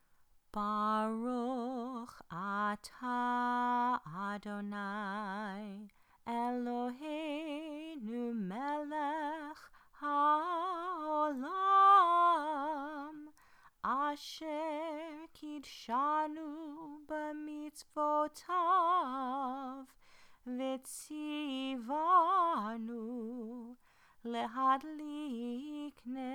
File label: Shabbat Candles sung